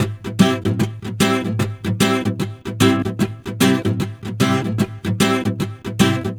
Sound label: Double Acoustic, reggae; регги